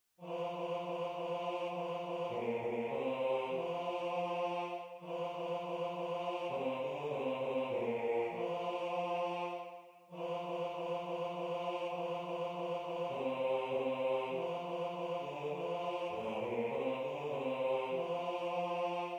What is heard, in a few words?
Bass